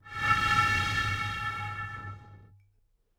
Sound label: Bottle Blown